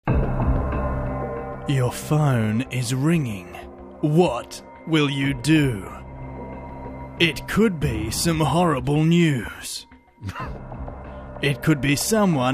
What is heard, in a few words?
ringtone suspense